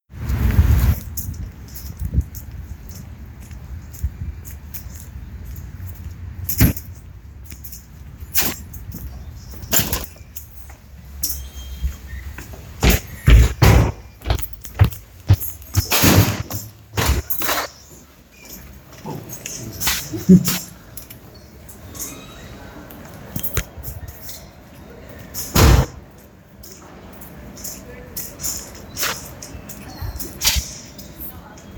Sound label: Walking to Class